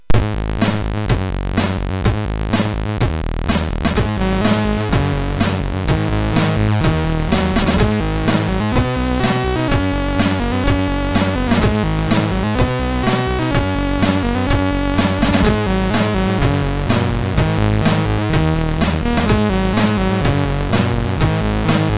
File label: MOS6581R3, GAMES; Shellshock